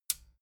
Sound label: metal button stereo click power on